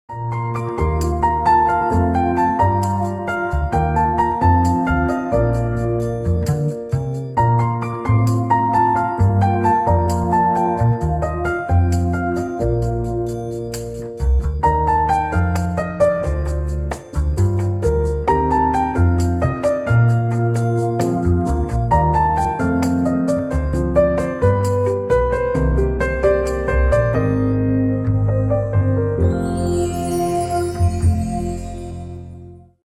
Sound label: Samsung Galaxy Trend